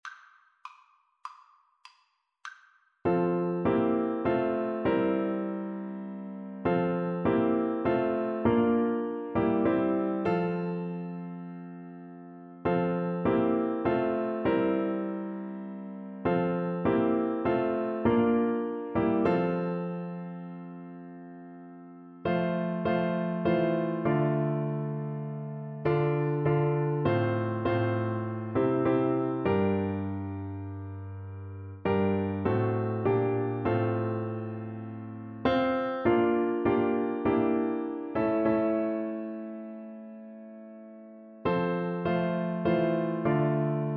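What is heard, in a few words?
recorder karaoke; be still my soul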